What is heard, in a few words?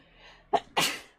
sneeze